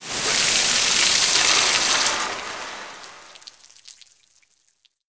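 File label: water pipe burst decay; Explosions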